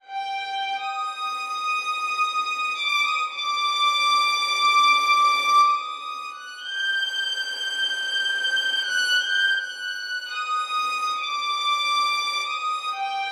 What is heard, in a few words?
Dreamscape Violin